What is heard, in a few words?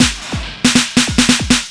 Noisetech fill, Drums Beats